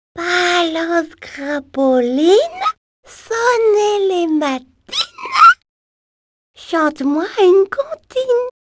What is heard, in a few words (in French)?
komic bebe matin